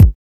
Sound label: 00s verby steel kick drum g key; Duration 0:01; Samplerate 44100 Hz; Channels Mono; kick drum samples